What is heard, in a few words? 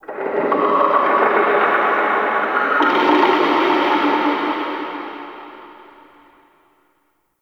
Under City Antique Rattle Distant